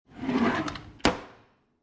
Drawer Close Wooden Kitchen, Household, Drawer Close, Wooden, Kitchen Drawer; Drawers